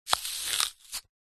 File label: food apple knife twist crush split